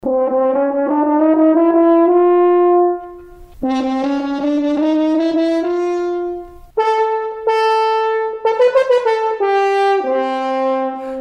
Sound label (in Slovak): Lesny roh